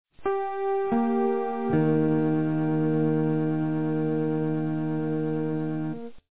pling A; WebTV

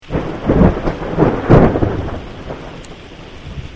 thunder

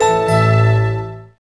End Conversation; msntv2

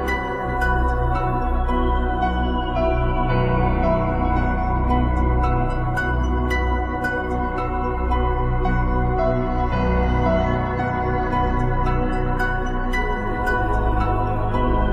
world map, Loops

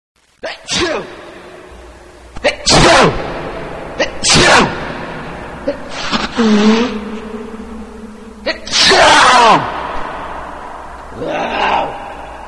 achoo

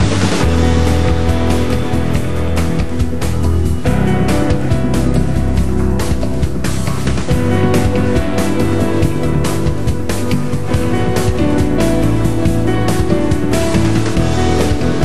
breaking loop; msnbc

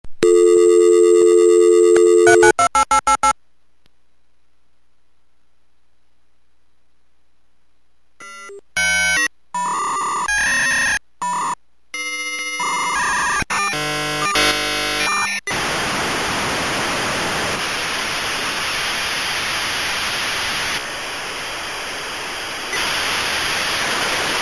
sm56 k56flex, Modem Sounds

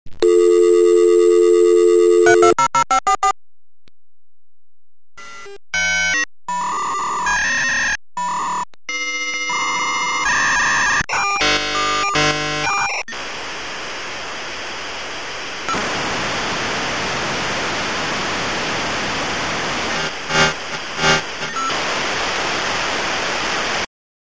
aol v90, Modem Sounds

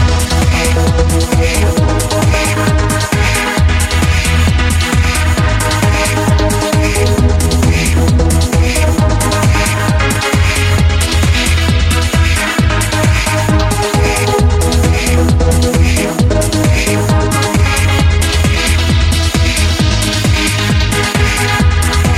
xpander loop; Loops

